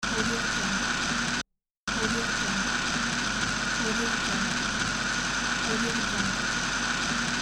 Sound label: grinding loop